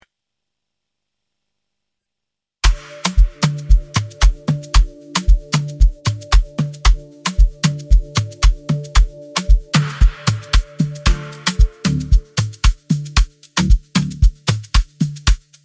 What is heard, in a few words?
GINGER MINT Strong INSTRUMENTAL